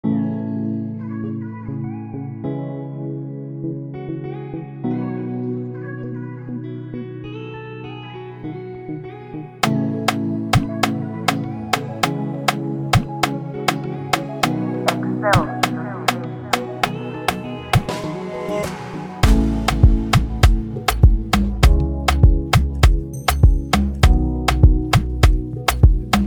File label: BEAT, afrobeat instrumental